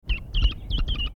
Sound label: poicephalus rueppellii, birds calls; psittacidae